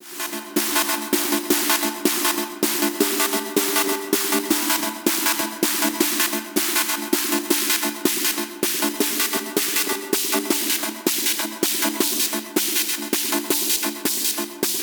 Sonicware ELZ_1 Masked Noise HPF Filter Mod

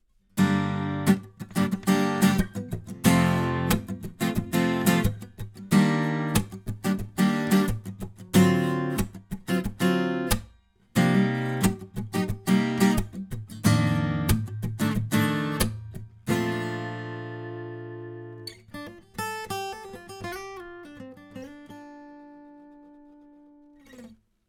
Takamine FT340 BS Mic Strumming

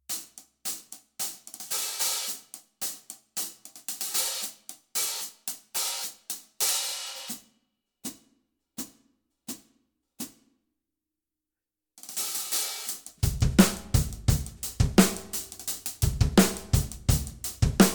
Dual HH

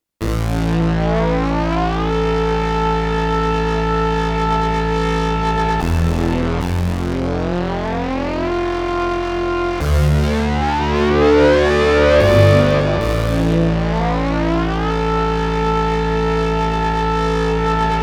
UNO Synth Pro X Presets

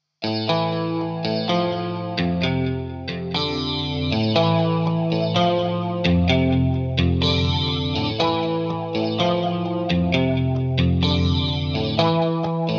Yamaha SPX90 Flanger Guitar

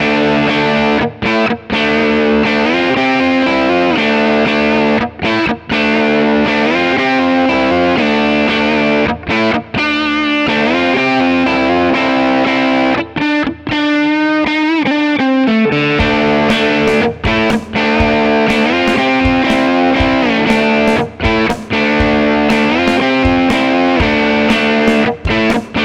Godin Session Strat Mid Position

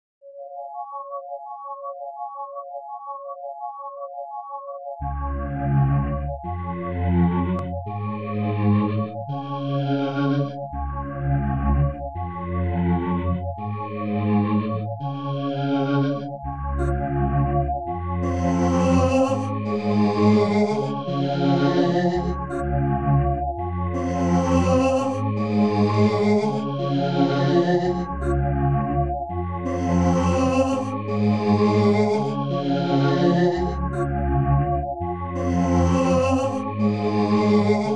Virtual ANS 3 Voice

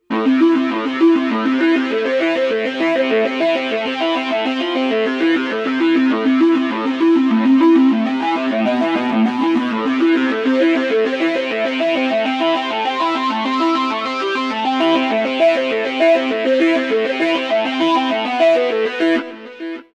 equinox Technoid

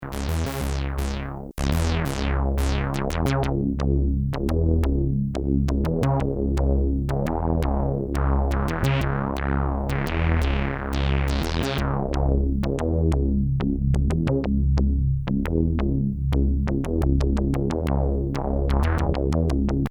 Standard Res Bass

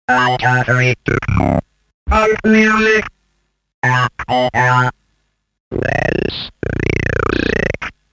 Talking Synth

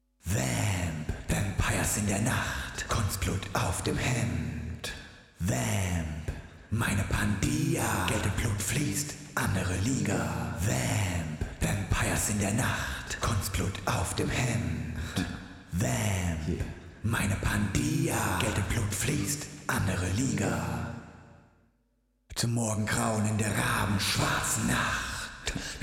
Dynamic Vocal Slap; Tverb; VMPires

